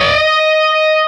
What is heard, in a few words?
bend full